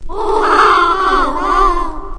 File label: poltergeist; Various Strange Sounds and Effects